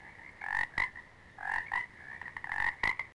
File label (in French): GRENOUILLE, amphibien; CRAPAUD